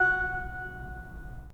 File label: Pipe Mix Celeste, 3D Organs; E-MU Producer Series Vol. 5 – 3-D Audio Collection